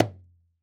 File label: Tabor Drum Small; World Percussion; Cymatics; Emperor World Percussion